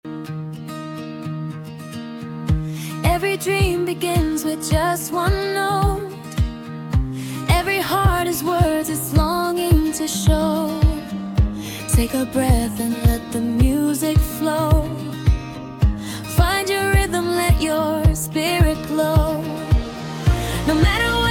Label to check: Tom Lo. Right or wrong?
Wrong